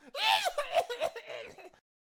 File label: Death Vocals; Mad Mechanic